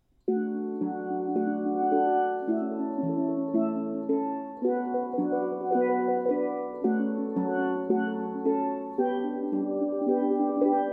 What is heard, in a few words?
Canon in D, Steel Drum Band